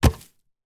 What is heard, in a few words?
wood land